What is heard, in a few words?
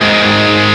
G#m; osu